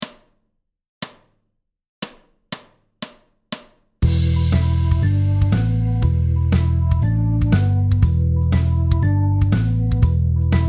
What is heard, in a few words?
Backing Track; Frosty Ice Cream